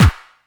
KIT, Dance Mega Drums; Best Service

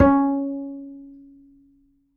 mf G, G string upright bass; Upright Acoustic Bass

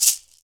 SHAKER, Afro Shakers, Shake&Rattle

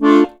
HOHNER, akkordeon

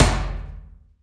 HYBRID, Heart of Africa; Spectrasonics; AF.HYBRID

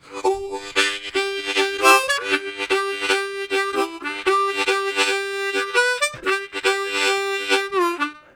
Chuggish, Harmonica; Zero G

